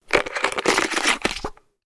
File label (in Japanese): ペットボトルを閉める